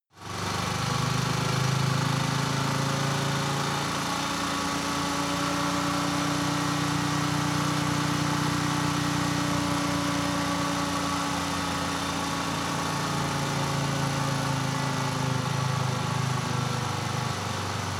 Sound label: motorcycle engine accelerate decelerate; motorraeder; verkehr transport